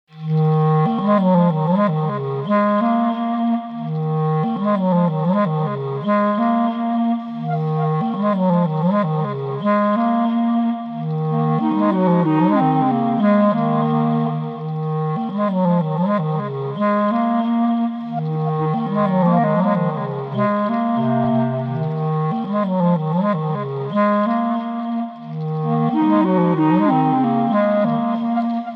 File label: circle of clarinets